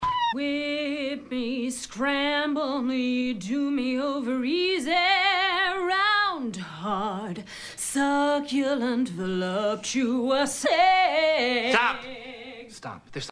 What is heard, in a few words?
Jingle